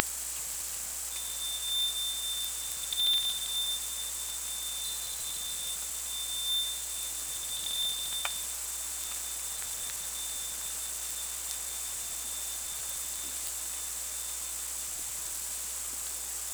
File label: sonar